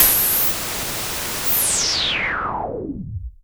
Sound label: Pulse, Filtered Noise